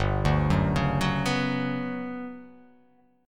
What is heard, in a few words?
G Major Sharp 11th G down, chord; arpeggiated; JGuitar